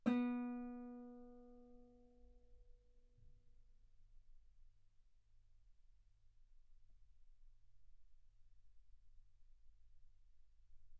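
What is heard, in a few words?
Guitar ff B string B3; sul B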